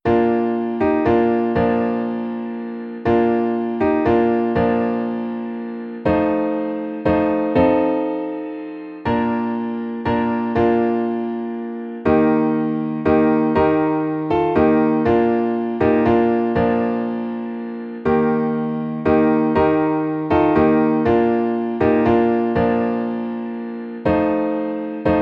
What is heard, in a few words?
Silent Night Bb